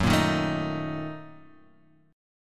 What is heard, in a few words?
A sharp Major 7th Suspended 4th Sharp 5th F sharp